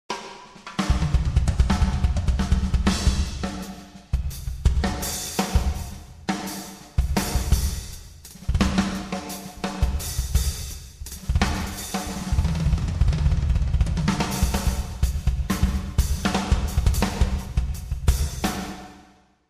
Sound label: solo